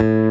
bass

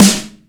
normal hit clap

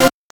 synth; osu

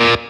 Staccato

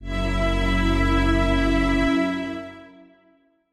Strings

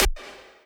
lead

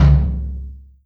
TOM; osu

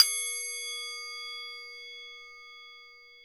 soft hit clap

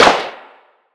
Clap; osu

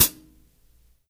drum hit normal; osu

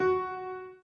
Piano Chord

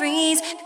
vocal

